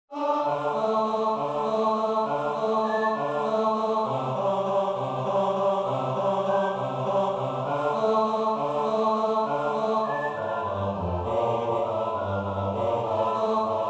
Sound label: No. 69 Tutti Finale Thus I stand like the Turk Bass